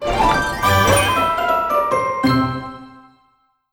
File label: Crunchy Finish